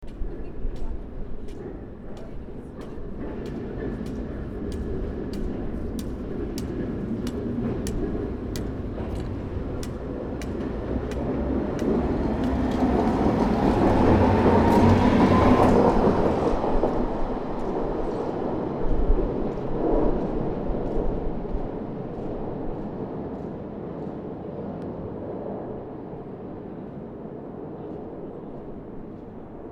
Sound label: Blind Man Tram, Prag field